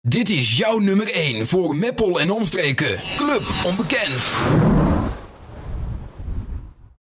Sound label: Jingle; Club Onbekend